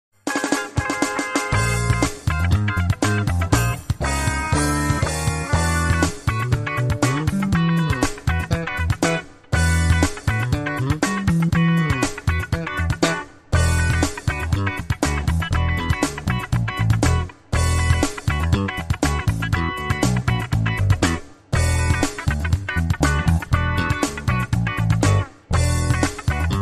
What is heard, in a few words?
Funky